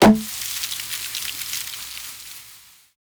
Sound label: confetti Gun